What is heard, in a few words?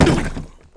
JUMP DIE; maskdemo; Oof!